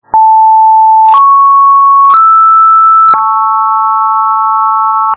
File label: chord; prog